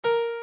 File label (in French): la3